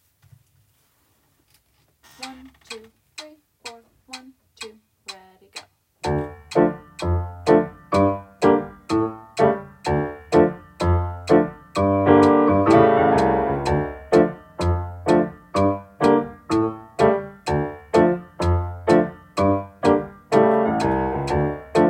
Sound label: Mainstreet Shuffle Secondo